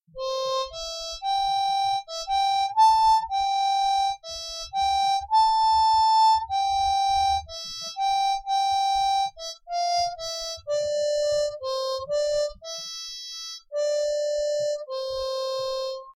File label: harmonica; michael and the offshore